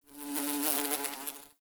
Bee; Warframe; Ambience; Nineteen Ninety Nine

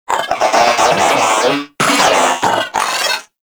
Vocal Idle; Warframe; Moa

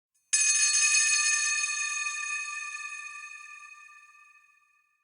High Bell; Warframe; Clan Dojo; Dojo Randoms; Ambience

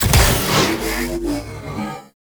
Bullet Jump, Motorcycle; Warframe; Motorcycle Ninety Nine

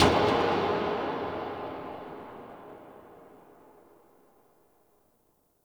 Creepy Metal Swell Clank; Warframe; Entrati; Dante Unbound Leverian

